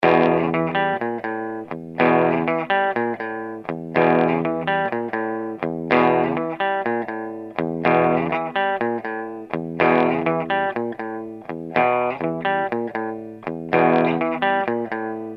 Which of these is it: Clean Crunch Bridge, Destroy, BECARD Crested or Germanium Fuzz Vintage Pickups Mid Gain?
Clean Crunch Bridge